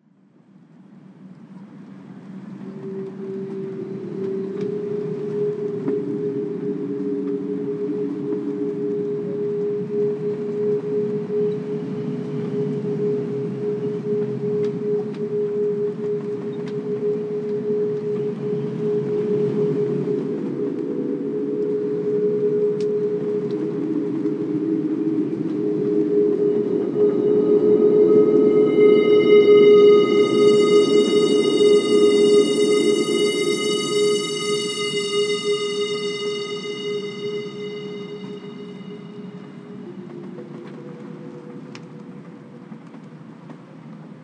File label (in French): SIRENES